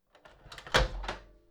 Microwave Door Open

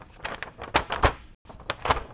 Paper Crinkle

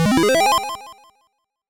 Open Treasure Chest 8 Bit

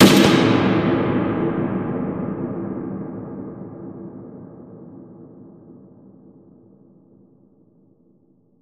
Cinematic Rifle Shot

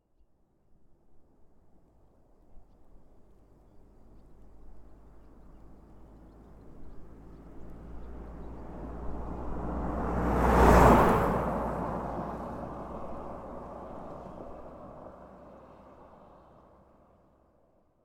Car Pass By High Speed

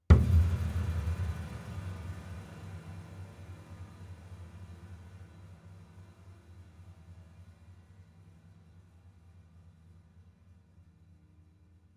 Piano Hits Rattle

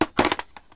Gun dropped

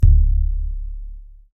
Tlapanhuehuetl

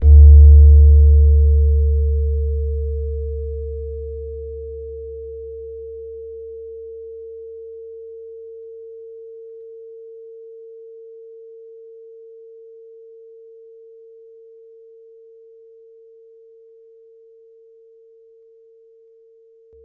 Metallophone Hit

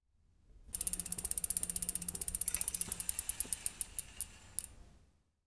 Bicycle

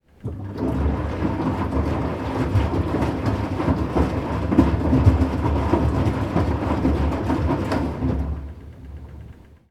Washing Machine Running Short

